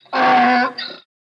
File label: donkey; Immortal